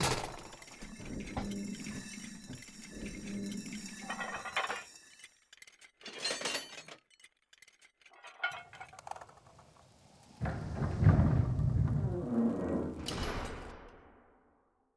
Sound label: Metal Gate Big Open; adams venture origins